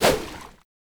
Water Balloon Fire

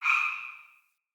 Cave Ocean Creature Close

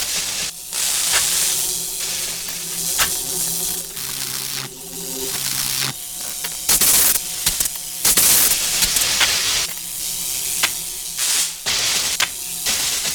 Vending Machine Sparks Loop; Ambience; Props; Warframe; Nineteen Ninety Nine

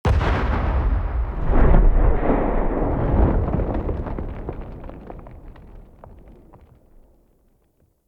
Bomb Distant Defacing Explosion; Encounters; Collector

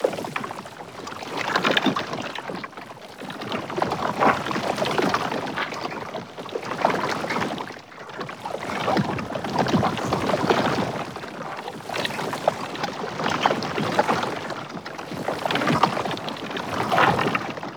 Ink Pool Loop; Warframe; Shadowgrapher

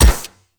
Shot Mech, Weapons; Akarius Prime

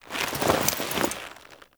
Move Foley Long; Characters; Warframe; The New War; Veso

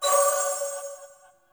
Choir Bow Charge Full; Warframe

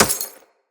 Bullet Impact Glass Large; Weapons

